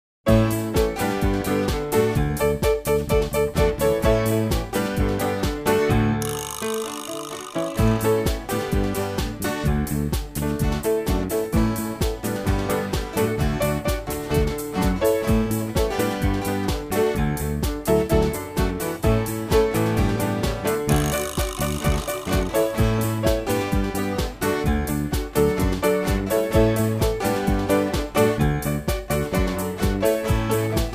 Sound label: accomp; HISWords Music; No Vocals